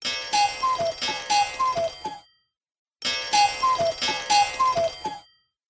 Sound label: cuckoo o clock ringtone